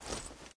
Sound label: angelic step; Smite; Thanatos